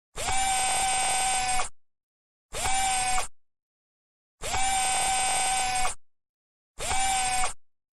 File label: Robot Movement Sound Effects